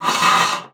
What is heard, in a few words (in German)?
Luft; Xsample VOL-3; STACC+FX